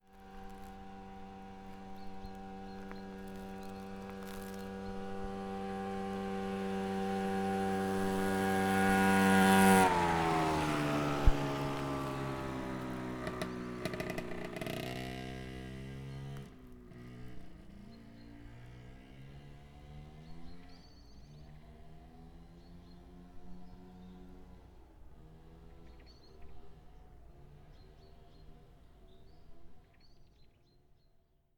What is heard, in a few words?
Motor Scooter Passing On Icy Road